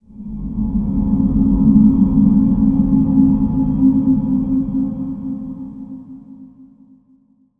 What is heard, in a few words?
environment stinger synth; Aragami